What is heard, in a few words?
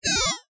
powerdown; mini-synth for making short "utility" audio cues